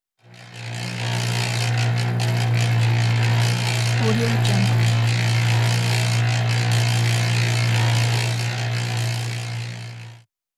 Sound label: concrete mixer